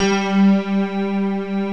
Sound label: ANALOG STRING; OMI - Universe of Sounds